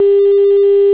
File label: Mono 8Khz 400hz Tone; Imaad 4Bit; nokia; devsound